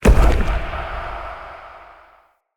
Dodge Trap Spawn; Enemy; Abilities; Characters; Circade

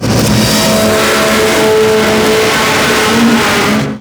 Sentient Vessel Movement Limb, Enemies

